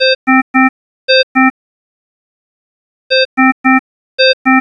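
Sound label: power high, alarms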